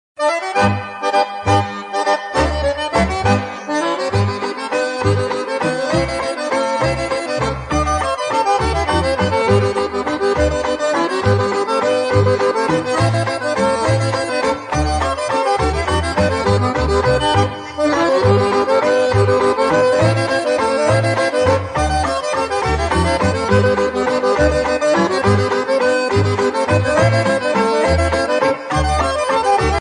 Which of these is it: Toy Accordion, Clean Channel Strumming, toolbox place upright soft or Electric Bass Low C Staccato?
Toy Accordion